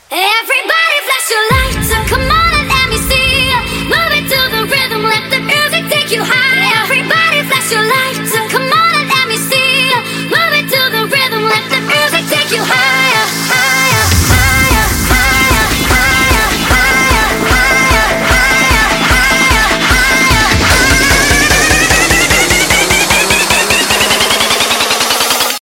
Hardstyle Higher

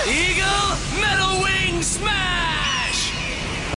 Metal Wing Smash

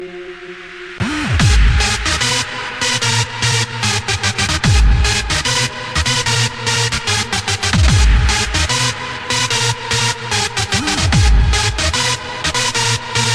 techno hardstyle, ringtones

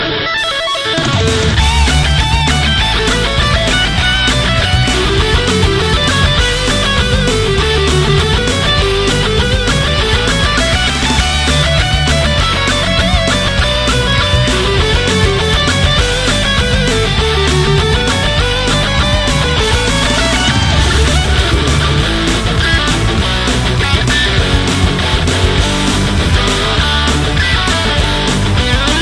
canon d rock; ringtones

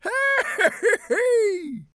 dwarf cheer

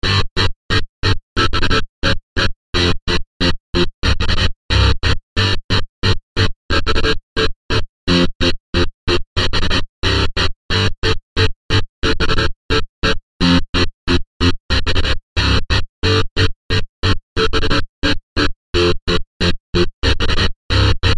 Rocking Tune

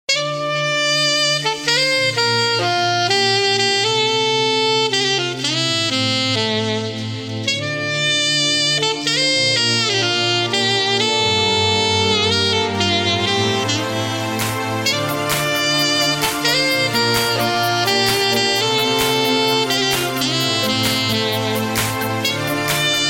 sax techno

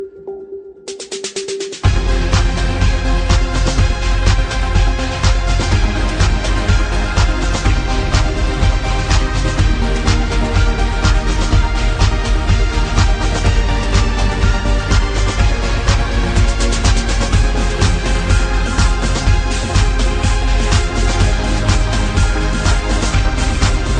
Hard Drive Music

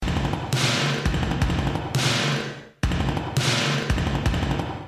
Jackhammer

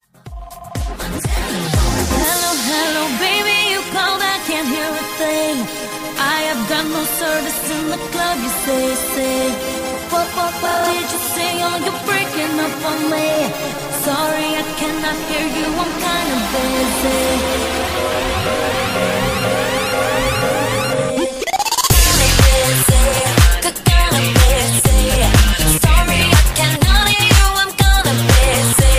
telephone electronic

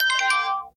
quad tone; GTA V

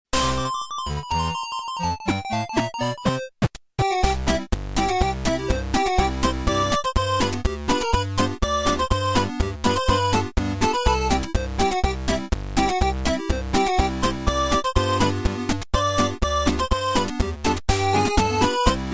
Polka

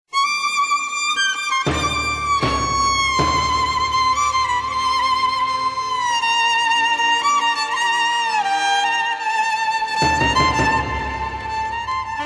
violene; alternative

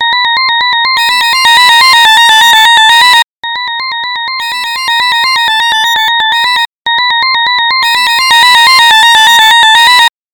Dishwasher

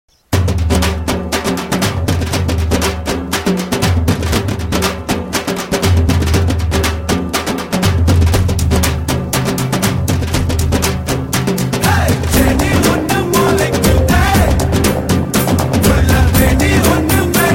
kiss beats

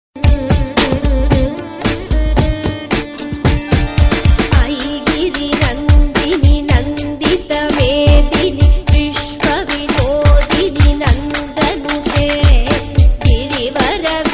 suprabatham remix

